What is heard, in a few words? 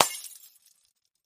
Christmas Bulb Crash; housewares